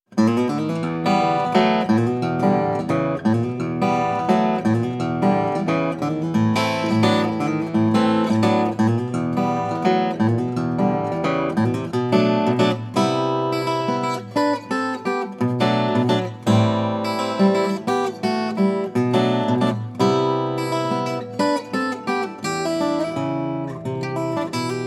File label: Blues; Guitar Music